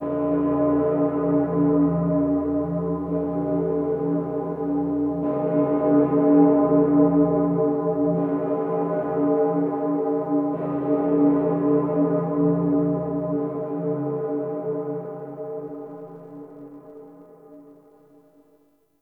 church bell ring clear; Ambience; Dagath; Warframe; Clan Dojo

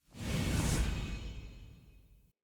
Transmission Out, UI; Omen Beast; Transmission In Outs

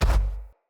Step Default, Footsteps; Enemy; Corrupted Sproutfolk

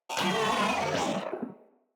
Moa Vocal Death

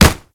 Kubrow Run Snow; Warframe

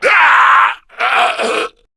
Death, Male; Warframe; Grineer Male B; Battle Barks

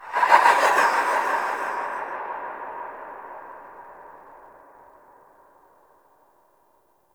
Movement Accent, ambience; Warframe; Harrow Quest; Operator Twin

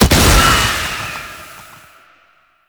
Boss Clone Death; Enemies; Abilities; Warframe; Oraxia

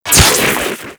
Microwave Pistol Shot; Warframe; Grineer

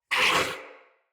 Pain Knight Male; Battle; Barks; Dialog; Mendicant

